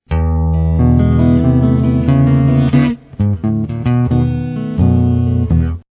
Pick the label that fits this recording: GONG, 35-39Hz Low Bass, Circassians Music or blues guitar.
blues guitar